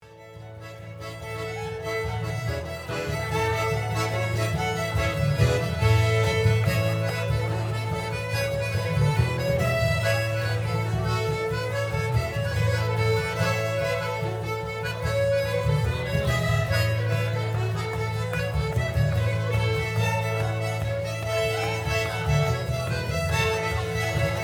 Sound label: Polka Live extract; Kerry